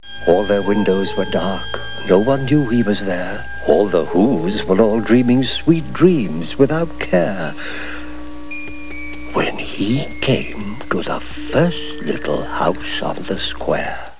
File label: square